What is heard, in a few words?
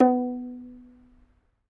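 African HARP B 4; AFR. HARP1; Heart of Africa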